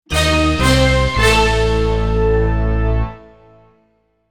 Dun dun dun shock transition suspense, cartoon orchestral